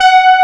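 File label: brass G4, SYNTHESIZER analog brass; AKAI S6000 CD-ROM - Volume 1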